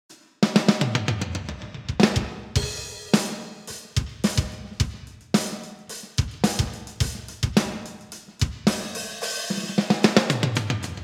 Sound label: Strike Pro naturell sounds